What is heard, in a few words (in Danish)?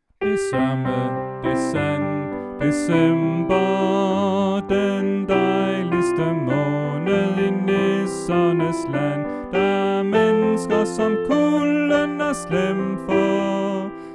Sopran; oevefiler